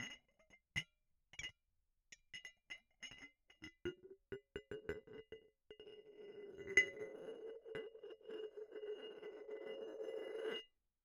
Bottle Struck

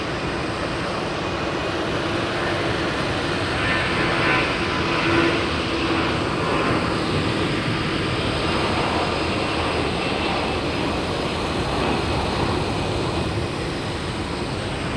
Heathrow Takeoffs; Sound Scapes

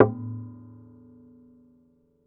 Knock, Classical Guitar

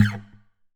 Classical Guitar Scrape; Classical Guitar Extended Technique Stereo

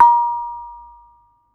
Kempyang, Gamelan

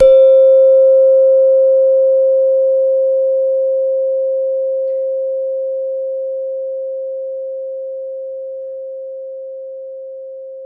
Gender, Gamelan

